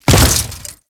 Step Flesh, Footsteps; Warframe; Grineer

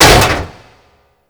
Frame Step; Warframe

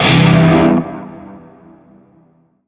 Shawzin Maj Chord; Warframe; Narmer Shawzin

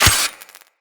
Melee Dagger Impact Robotic Flesh

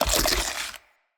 Bite Small Impact Flesh; Weapon Impacts

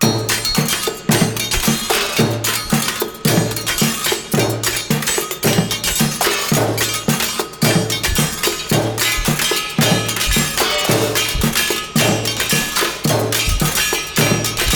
Vent Kids Hideout Music; Skeg

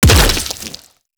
Golem Grenade Explosion; Warframe

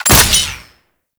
Ghoul Sawman Shot; Warframe; Grineer Ghouls

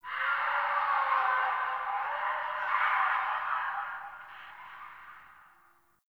Distant Infested Screams Winter F; Warframe; Ambience; NineteenNinetyNine

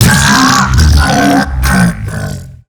Hand Bomber Death; Warframe; MiTW; Battle Barks

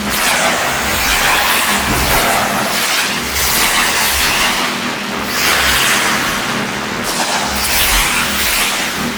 Launch Tube Loop; Ambience; Interior; Warframe; Orokin

